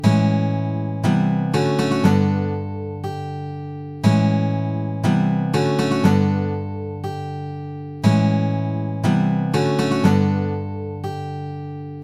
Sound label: chord timing; duration and timing